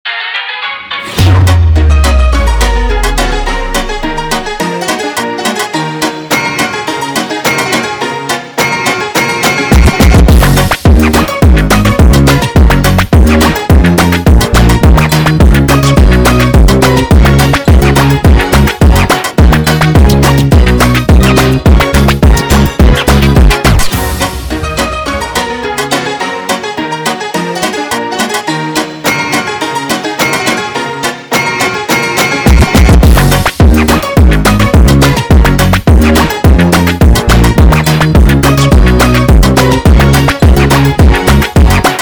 sped Up Instrumental

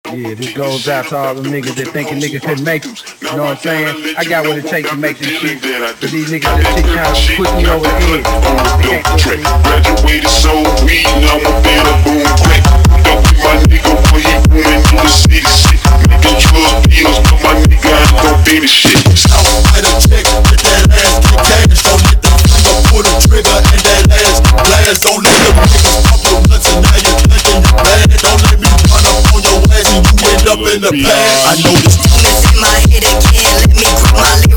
Hardbass, funk

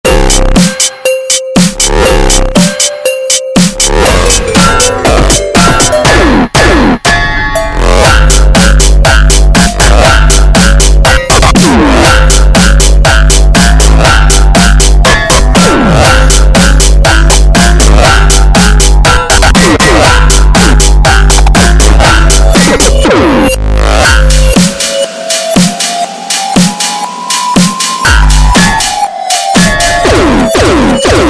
Arabic Hardtekk; funk

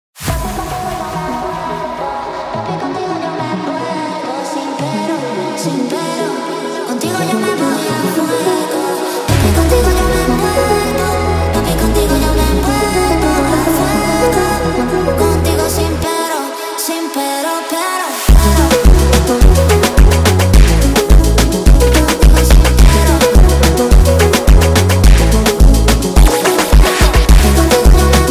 Brega Sped Up, funk

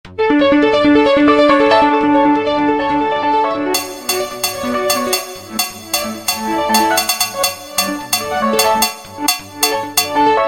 Funk

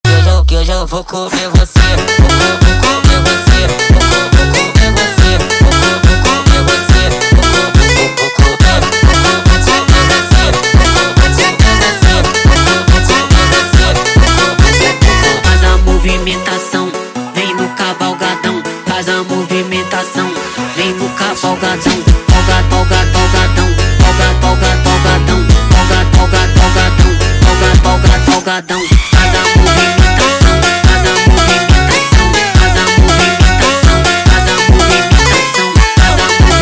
sped Up

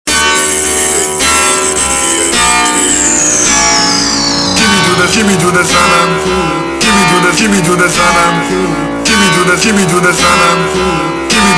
Funk slowed, funk; فانک ایرانی

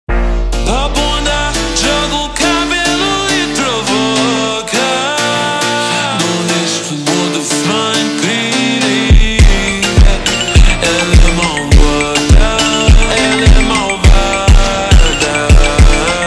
super Slowed, فانک

